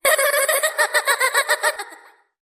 Eerie Kid Laughs

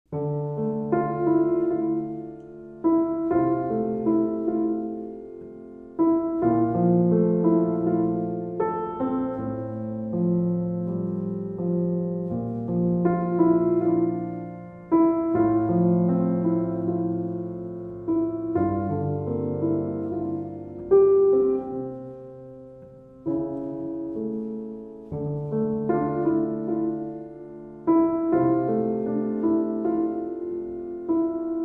Solo Piano

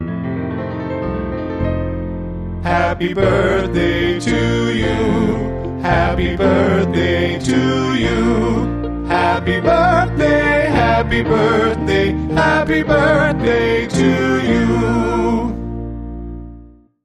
Male Group Vocal Traditional Happy Birthday

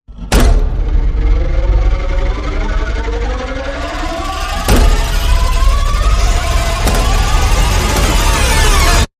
Shadow Woods Frantic Rise

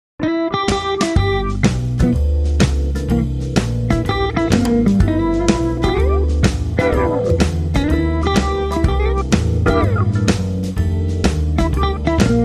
Shuffle

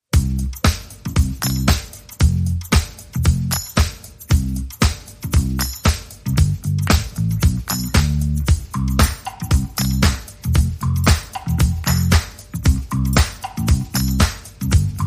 Drums and Bass

